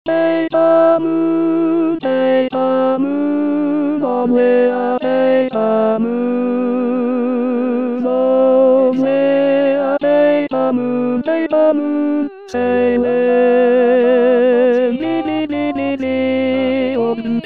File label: Tenor